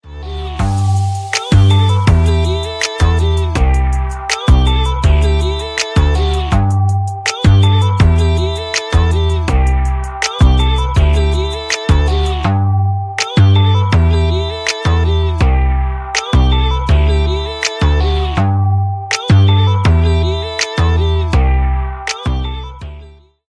hiphop rap ringtone; dj k hiphop rap; english ringtones

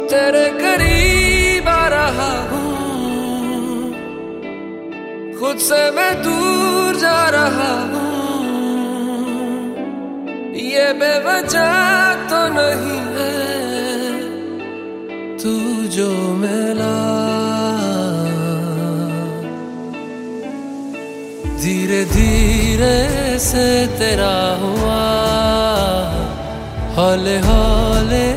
ringtone, bollywood ringtones

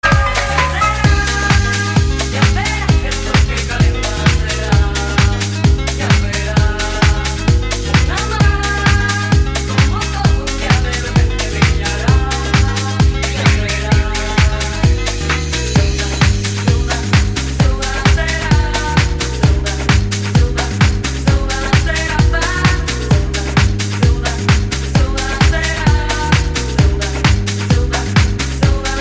deep club mix dance song tone ringtone

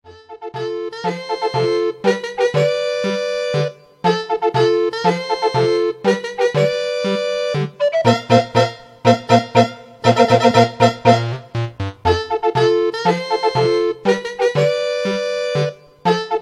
ringtone; smg toad brigade

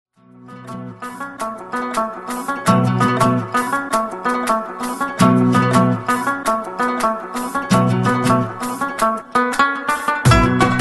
romantic pashto guitar ringtone

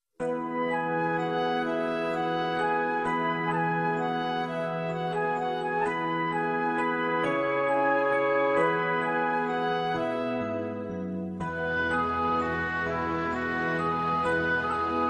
serene mood ringtone

